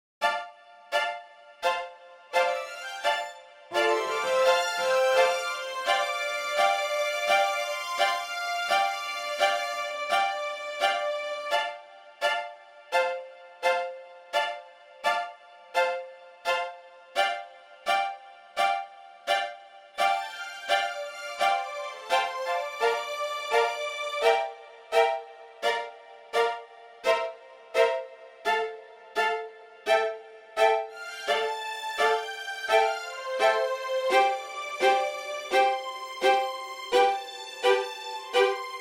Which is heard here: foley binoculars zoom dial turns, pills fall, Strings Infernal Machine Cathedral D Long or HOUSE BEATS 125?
Strings Infernal Machine Cathedral D Long